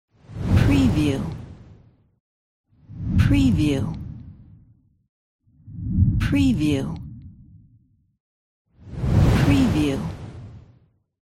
woosh transition deep

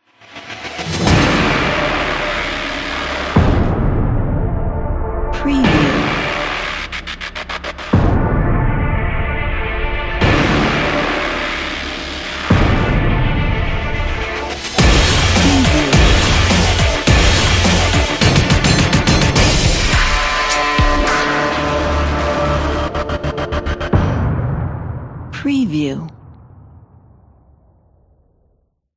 epic action hybrid trailer

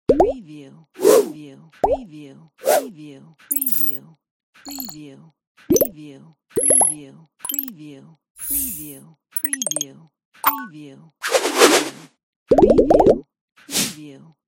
motion infographic sound effects

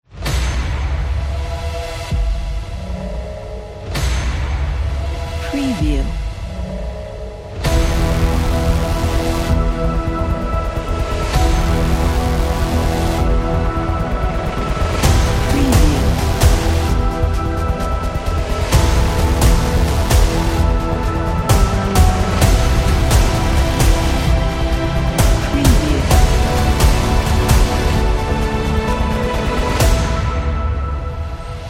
teaser intro

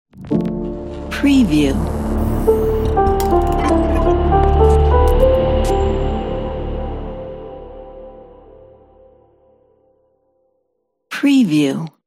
glitch dark piano logo

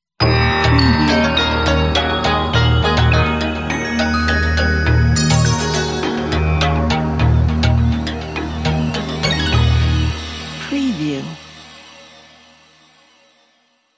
news ident; asia

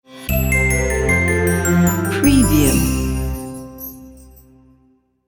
christmas logo

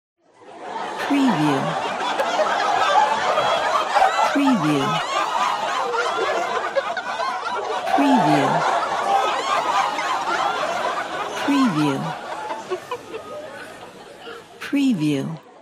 laughs

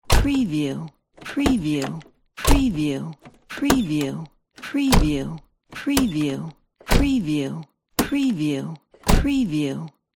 car doors

